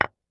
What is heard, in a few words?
wood sharp hit; lila